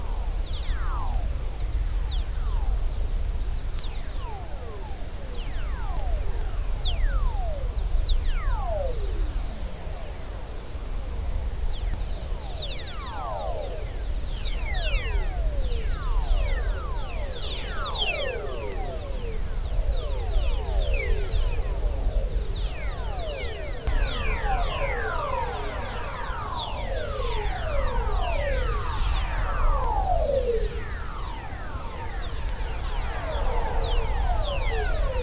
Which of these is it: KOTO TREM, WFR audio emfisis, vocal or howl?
WFR audio emfisis